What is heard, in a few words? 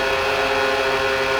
klaxon, Train; Ganz csuklos1311